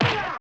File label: perc voice, Roland MC-909